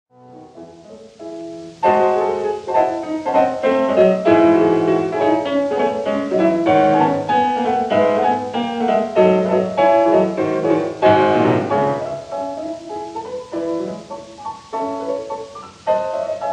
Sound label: Symphonic Etudes for piano; Schumann; Op. 13